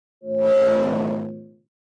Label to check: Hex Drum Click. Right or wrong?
Wrong